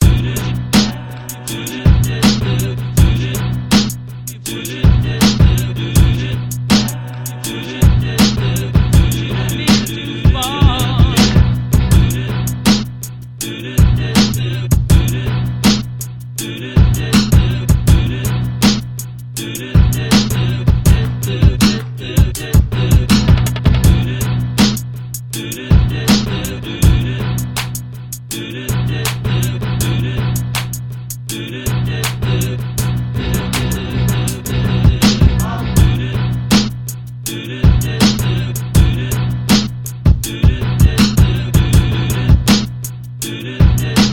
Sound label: beat